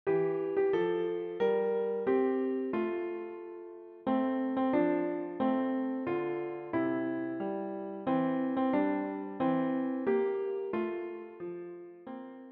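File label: piano; Happy birthday to you - für Klavier; Geburtstagslied für Klavier, inkl. Fingersätze, mit Vorspiel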